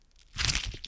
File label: Bone clicks Gore squelches